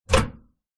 household microwave oven door open metal recorded inside